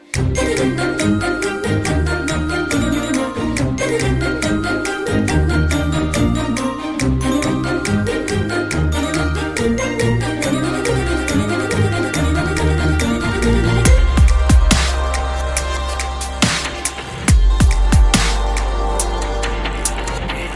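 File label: BG Music Telugu Ringtone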